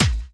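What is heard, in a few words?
snare drum bass drum, Dance Mega Drums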